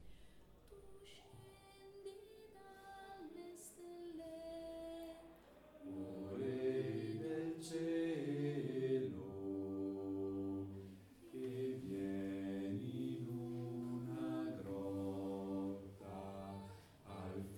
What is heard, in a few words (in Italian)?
Tu Scendi Dalle Stelle BASSI; Avvento e Natale 2016